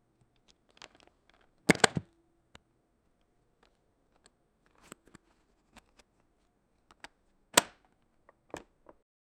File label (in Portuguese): abrindo uma maleta, briefcase